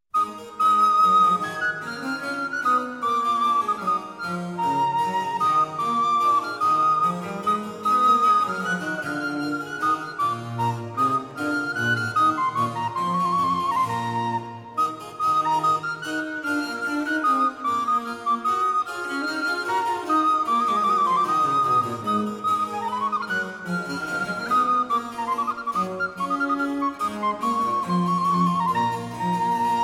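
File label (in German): drei Stücke unbekannter Meister für Sopranblockflöte; aus tuitnement kabinet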